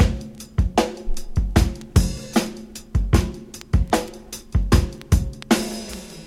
Drum loops Boombap

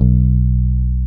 jopper, Bass

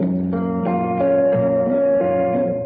guitar cabinet man

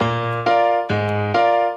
piano, phonky loops

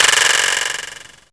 vibraslap

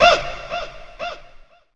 "woo"

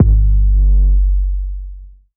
thump, Kicks

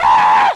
scream nongender; ooh ahh

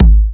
kick, Heartbeats

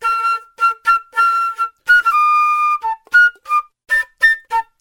flute, Loops Phonky

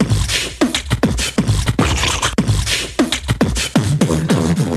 Drum loops, Boombap

